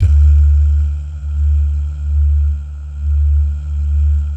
DAH, DAHS GUYS; Spectrasonics; Vocal Planet; Jazz FX

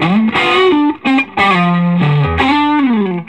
SOLO, Vintage Blues Guitar; USB Soundscan vol.22

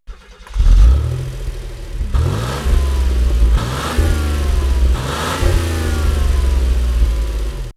Pwr FX Rev It Up!; X-Static Goldmine

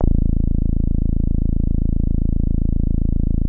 A1, Bass; E-MU Producer Series Vol. 6 – Analogia Project (CD 1); Boom da Bass 2

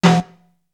tuney, snares; Zero-G - Funky Elements

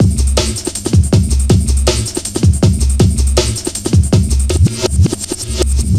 160 blubberblubber, Drum Loops; Total Drum&Bass

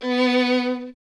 STR VLN, Vln Detache; Miroslav Vitous

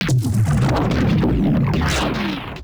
Bass Synth Effect; neuro; BIG5AUDIO

